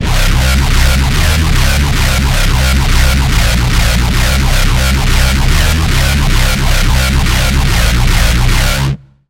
Bass; Chaos Engine; Routed Sound; Serum 2 Expansion - Chaos Bringer Vol.1

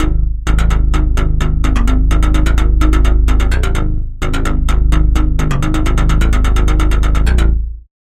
Bass; Routed Sound; Serum 2 Expansion - Star Scavenger Vol.1

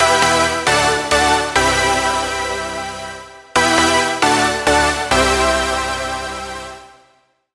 Lead; Routed Sound; Sylenth1 Expansion - Reality Maker Vol.1

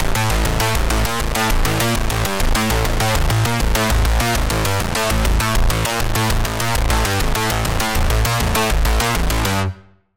Bass, Sylenth1

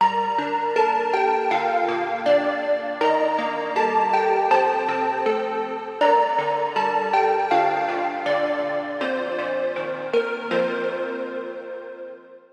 Key

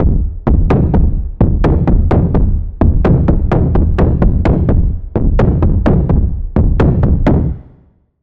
Impact, Sylenth1; Impact - Cocoon; Routed Sound; Sylenth1 Expansion - Quantum Enforcer Vol.1

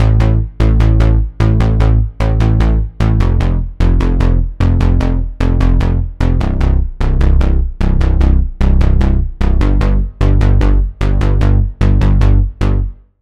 Bass; Routed Sound; Sylenth1 Expansion - Reality Maker Vol.1

